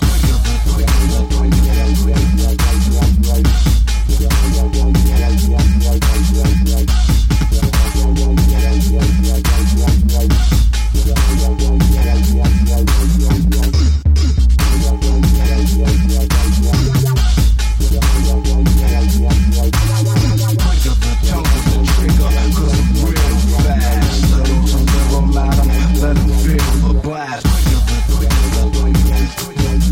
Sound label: DUBSTEP